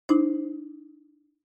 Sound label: OS Sound Notification